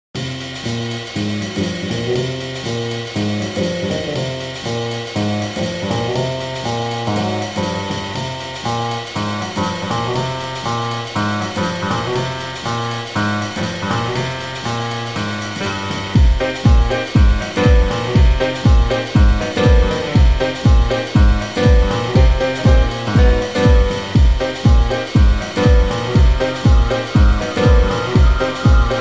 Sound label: Electro Swing